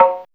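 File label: Fairlight IIx CMI Kick 3; 300 Drum Machines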